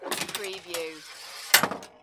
Pinball sound, ball rolls on rail and falls off end